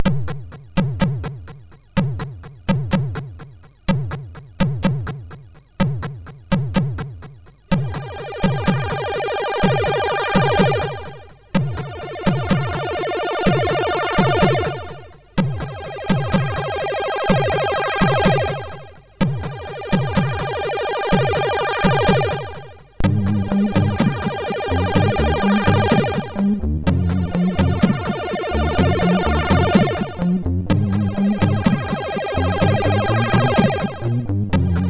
Dutch Breeze Advertisement, MOS6581R4

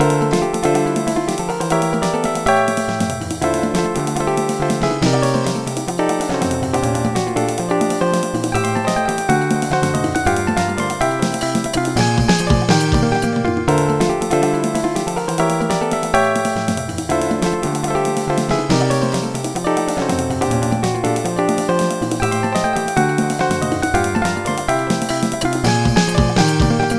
solo; Dr. T's MIDI Recording Studio

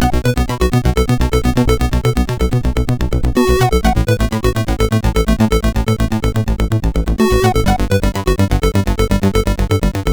sid MOS6581R2

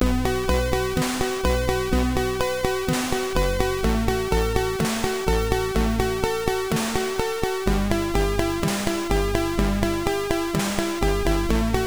sid MOS6581R4; If Only; Compod

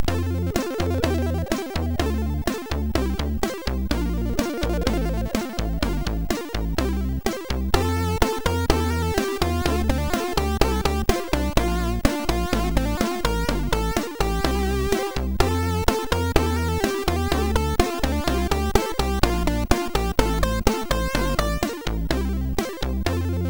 sid MOS6581R4; Steel; Cool End

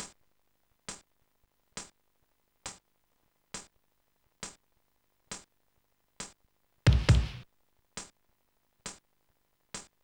rock; rock stop the freeway; Sonix Music Driver

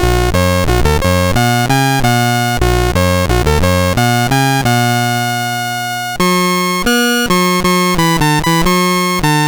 Dog Fight BASIC, MOS6581R4

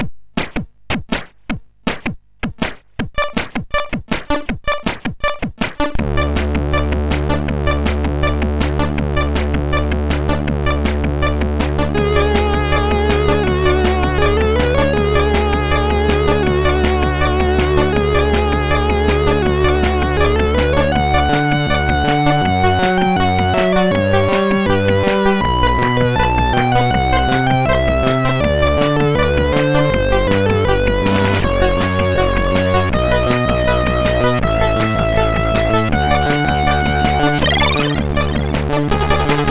Song, sid MOS6581R3

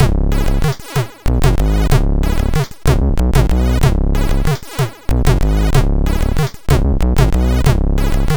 sid MOS6581R2

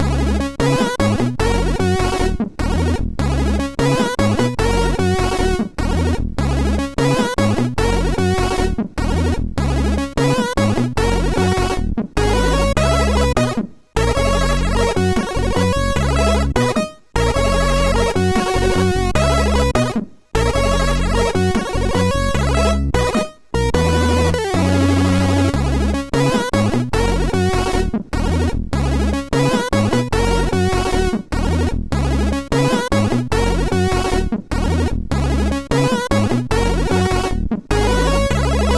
Drunk, sid MOS6581R4

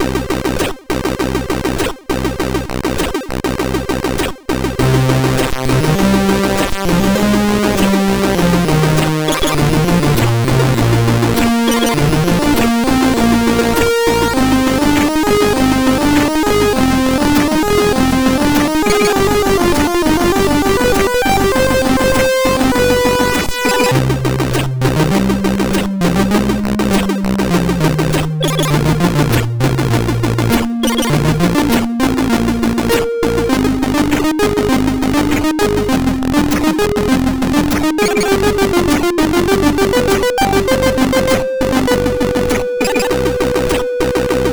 sid CSG8580R5; SM End Sequence

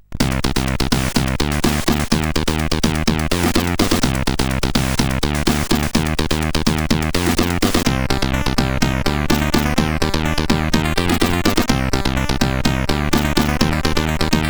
Butt Slap, CSG8580R5

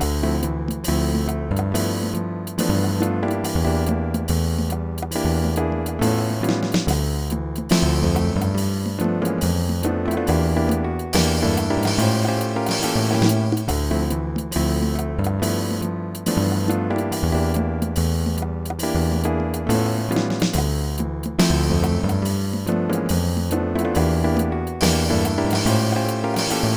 solo; Dr. T's MIDI Recording Studio

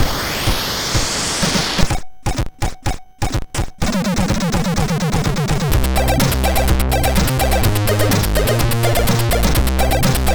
Shape Intro Tune, MOS6581R2

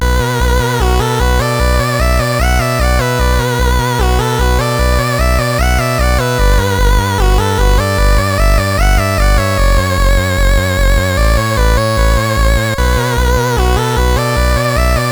Cracktro, CSG8580R5; sid

